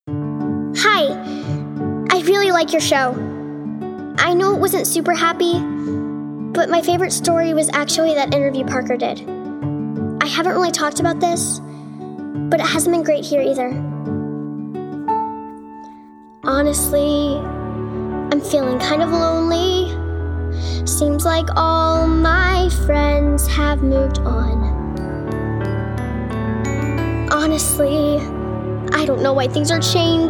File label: VOCAL; DESI – is an open, expressive kid. The role requires the ability to be earnest.